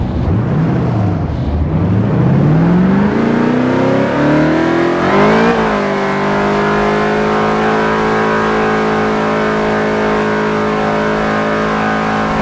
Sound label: rev, vehicles; gt500; TDMCarpack; TDMCars